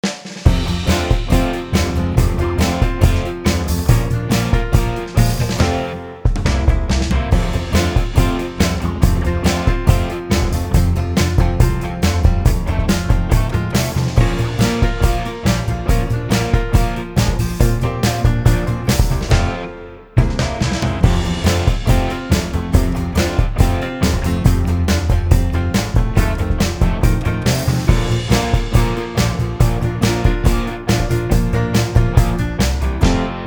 ACC

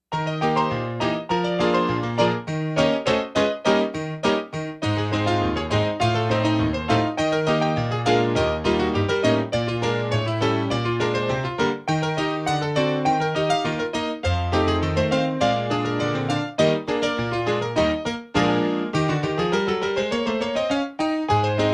Play Along with Piano; Piano Roll

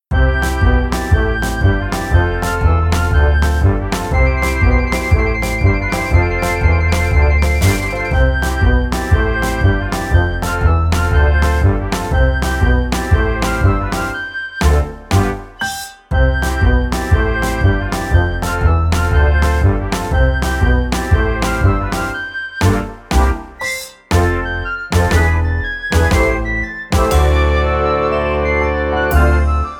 ACC

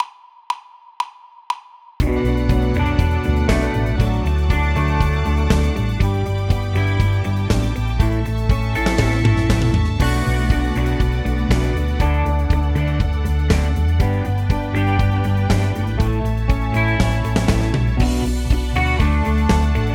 Uplifting 120BPM; 32 Bars

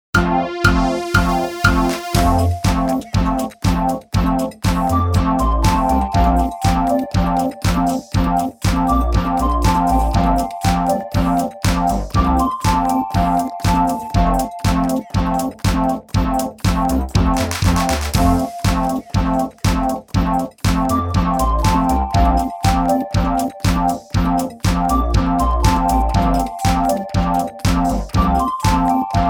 120 BPM 16 bar Techno happy

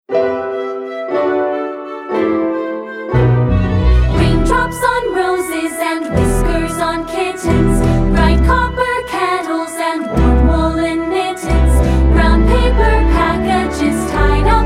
Vocals

